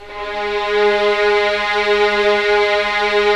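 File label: Strings Violins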